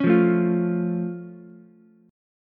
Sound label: A Augmented A, Ukulele; strummed; JGuitar; 6,5,5,8